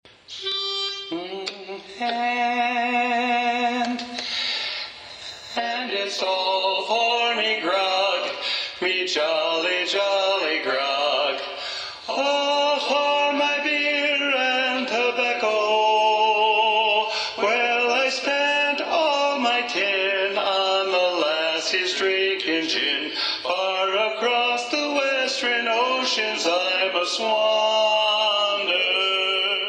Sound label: Lead Predominant, sea shanties; All For Me Grog